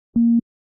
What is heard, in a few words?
computer beep; NCH Library